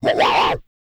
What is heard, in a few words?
BARK, MALE HUMAN; Spectrasonics Vocal Planet